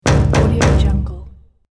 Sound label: knocking on window; sound-dispenser